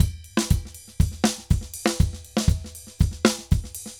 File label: DRUMS MERENGUE; Best Service ProSamples vol.14; AKAI